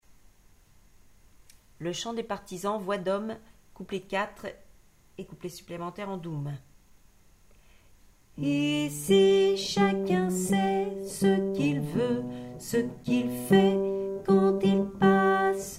hommes doum

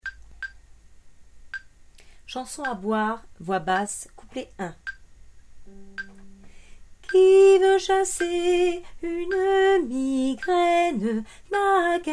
chanson a boire basse

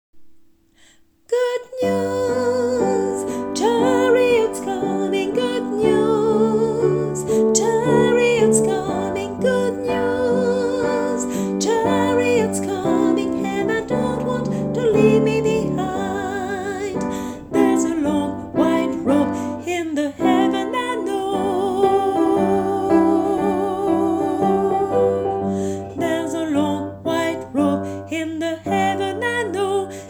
soprano

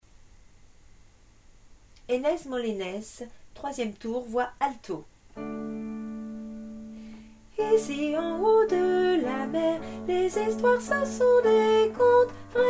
alto

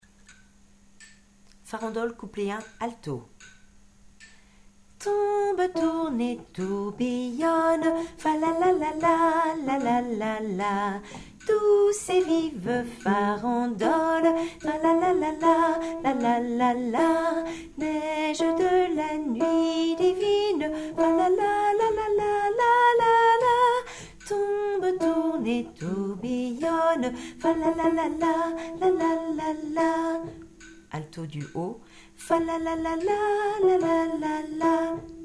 farandole alto

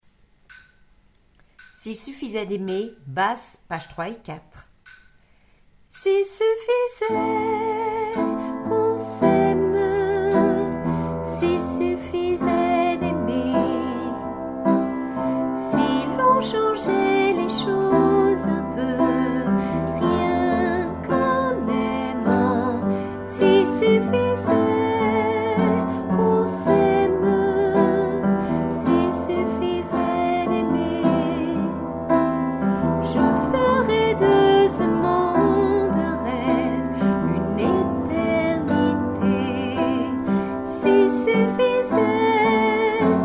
basse

